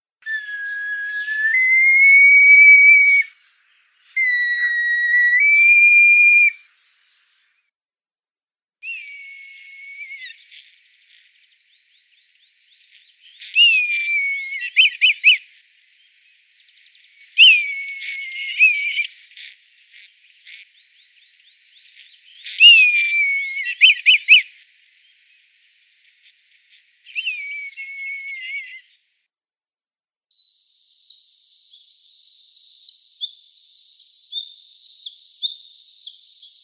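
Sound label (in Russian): Korshun krasnyj, red kite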